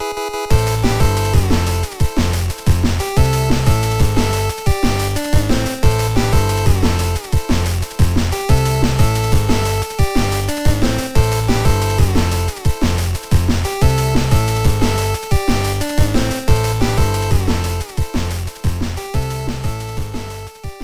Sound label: Ringtone; PewDiePie Legend of the Brofist; Pewd's